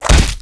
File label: hit punch; zoochosis; lazynap; dolphin deepsea monster